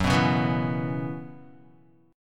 Gb 7th Suspended 4th Gb down Guitar, chord; Gb7sus4; strummed; JGuitar

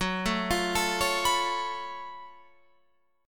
Gb Minor Major 11th Gb down Guitar Open F; GbmM11 arpeggiated; JGuitar

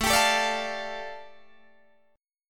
A 7th Suspended 4th Sharp 5th A, Guitar Open D; chord

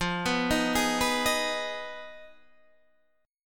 F Minor 7th Flat 5th F down Guitar DADGAD

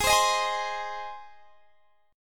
Ab Major Ab; Ab strummed; x,23,22,20,21,20

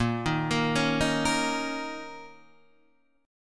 Bb Minor Double Flat 5th Bb, Guitar, DADGAD; 8,6,8,6,6,8

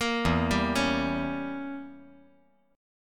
E Diminished 7th E

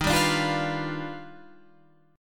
Eb Augmented Major 9th Eb down Guitar Standard; Eb+M9 strummed; JGuitar

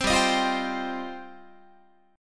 G 7th Suspended 4th Sharp 5th, Guitar

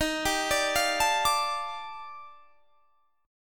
Eb Major Sharp 11th Eb; EbM#11; arpeggiated

